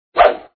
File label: wind; run